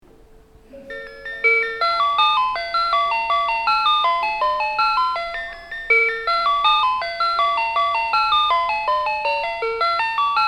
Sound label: Oppama Melody